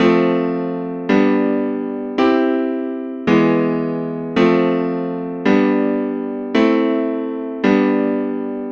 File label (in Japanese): Ⅳ→Ⅴ→Ⅰ→Ⅲ→Ⅳ→Ⅴ→Ⅵm→Ⅴ進行